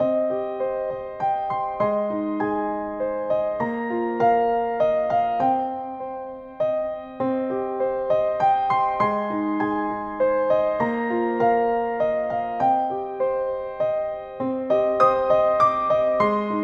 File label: Sad Moods Piano Loop